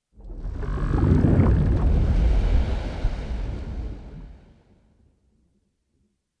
aquatics portrait machine

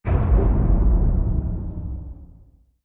distance disruptor fire; weapons; Stellaris

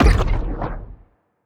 laser hit, weapons; fire rate; Stellaris; biogenesis

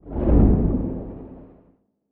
kaiju lightning hit whoosh; Stellaris; biogenesis; weapons

